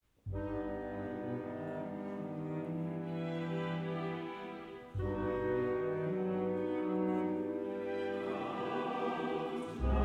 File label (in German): Chor; Das Volk